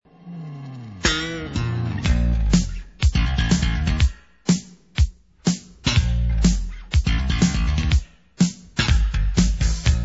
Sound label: BASS